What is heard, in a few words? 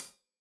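hat closed, hi-hat cymbals stereo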